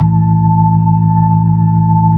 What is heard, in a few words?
B-3, Brite Ch. SL; Roland LCDP10 Keys of the 60s and 70s 2